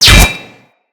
Bolt Impact Metal; Weapons; Cross Bolt

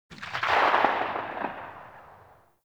Whip Crack Tail; Oraxia Whip

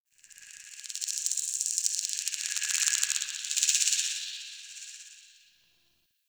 Clicky Cave Critter; Warframe; Tau

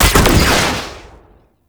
Grenade Launcher Shot; Weapons; Scaldra

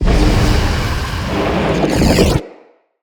Reclaim Beacon Activate; Synod; Ships

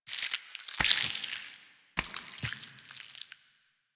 Taunt Flesh, Proto Infested Volatile Runner; Warframe

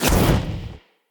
Casting Device Cast Left; Fenn

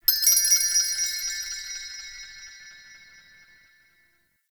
Interior Orbital Station Chime; Warframe; Tau

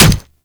Grineer Manic Claw Hit

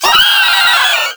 Volatile Runner Kamikaze Scream; Warframe; Proto Infested; Abilities

